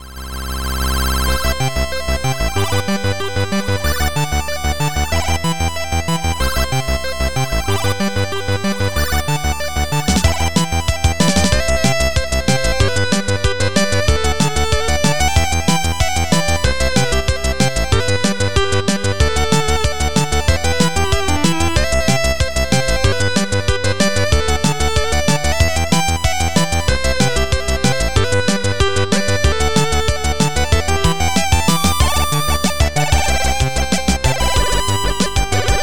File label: chip, streaming music